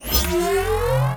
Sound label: Alt Charge, pistol; Crp BE Plinx; Blastor Pistol